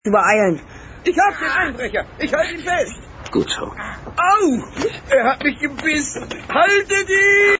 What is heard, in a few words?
aargh